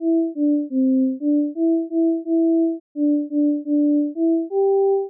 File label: rainy day tune